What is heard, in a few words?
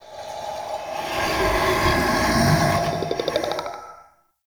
Male Vocal Idle; Characters; Enemy; Vocals; Mockeries